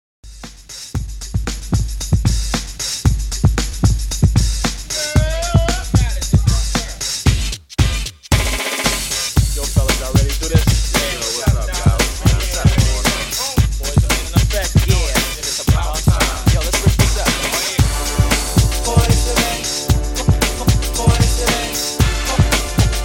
Classic Mix 114Bpm

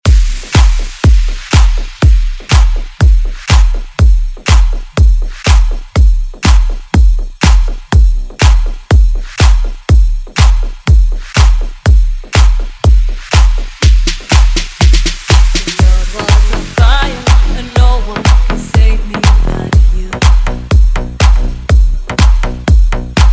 Dance

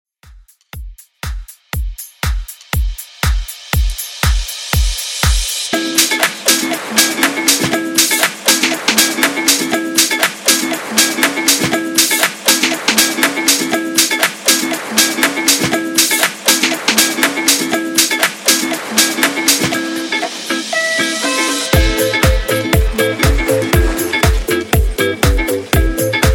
electronic house 120 bpm

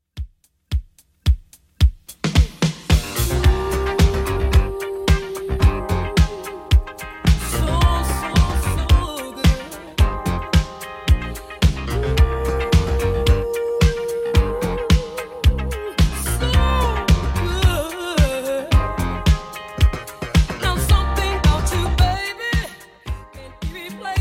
80s Redrum 110 bpm

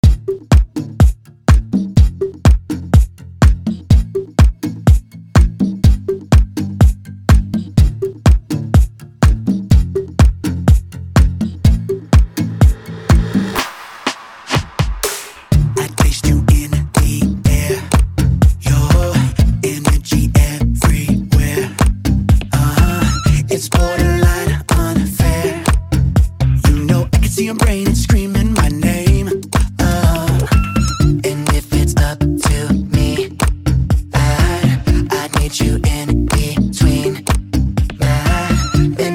Redrum

